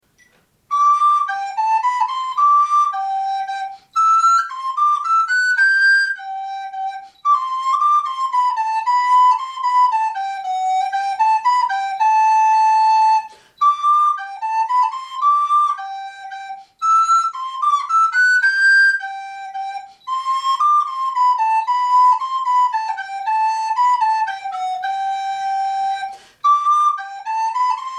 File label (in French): menuet, FLUTE; Bach